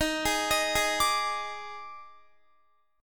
Eb Major 7th Suspended 4th, Guitar; EbM7sus4 arpeggiated

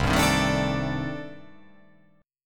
C Minor 6th Add 9th C

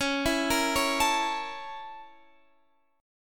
A sharp Minor Major 7th Flat 5th C sharp

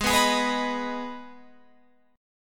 G sharp Minor G sharp down Guitar Open F, chord; G#m strummed

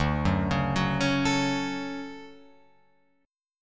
D Major 7th, Guitar, Open F; arpeggiated; JGuitar

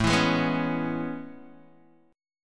A Major 9th A, Guitar, DADGAD

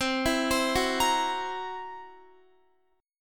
C 7th Flat 5th C down Guitar; C7b5 arpeggiated

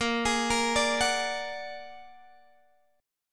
Bb Augmented 7th Bb down Guitar Open G

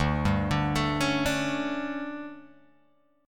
D Major 7th Suspended 4th, Guitar, Open G

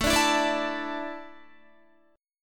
A Suspended 4th down Guitar; Asus4; strummed; 4F,4A,5C,5G,5C,6E

